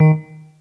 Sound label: Synth